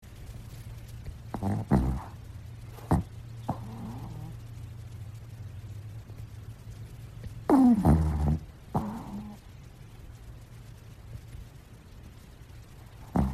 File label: small dog snoring